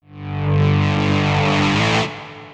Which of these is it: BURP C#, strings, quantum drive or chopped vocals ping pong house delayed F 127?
quantum drive